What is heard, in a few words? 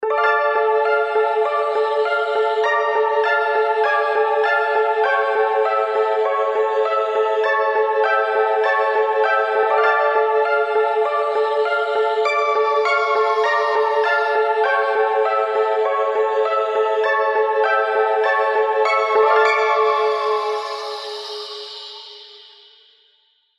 Piano Ghosts